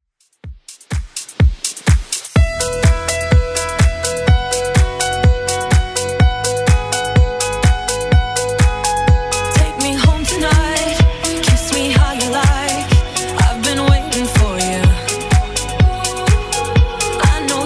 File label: Top40 Club Redrum 125 bpm